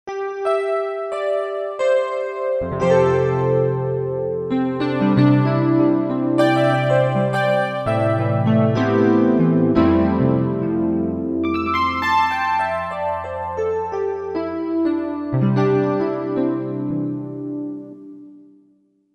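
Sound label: Electric Piano Saw, Korg MSC-1S; Korg MSC-1S Variety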